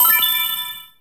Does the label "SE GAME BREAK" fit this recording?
Yes